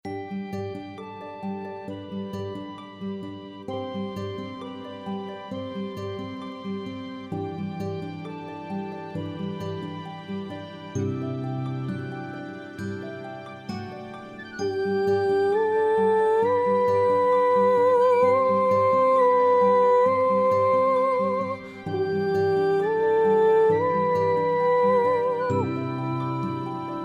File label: Soprano Only